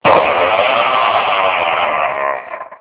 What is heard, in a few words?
madhouse; human